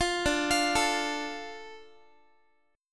D Minor D Guitar; Dm arpeggiated; JGuitar

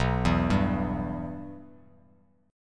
B Suspended 4th Sharp 5th B; Bsus4#5 arpeggiated; JGuitar

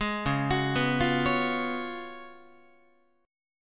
Db 11th, Guitar; arpeggiated; 11,9,9,9,9,12

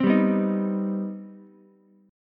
C-Minor Major 7th, Ukulele; strummed; JGuitar

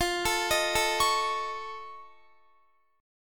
F Augmented 7th F Guitar, chord; arpeggiated

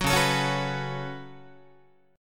C sharp 7th Suspended 4th Sharp 5th, Guitar; C#7sus4#5; strummed; JGuitar

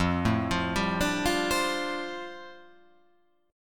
F 6th Add 9th F down, chord

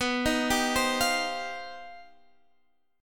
C Minor Major 11th B

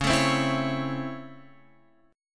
Db Minor Major 7th Sharp 5th Db Guitar Open F; DbmM7#5 strummed; JGuitar

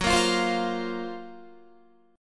Gb Major Sharp 11th, Guitar; GbM#11 strummed

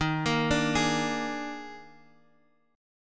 Eb Major 7th Suspended 4th, Guitar Half Step Down

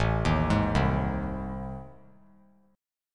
A Minor Major 7th Flat 5th; arpeggiated; AmM7b5; JGuitar

